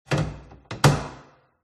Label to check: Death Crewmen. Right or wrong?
Wrong